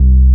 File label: SY BASS, ANALOG BASS; Music Store - Claudius Diemeter Schwerlos